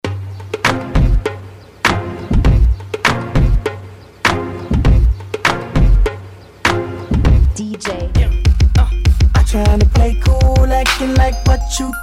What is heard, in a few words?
Song Instrumental Bed